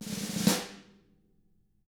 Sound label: snare roll; ILIO; Double Platinum Drums 1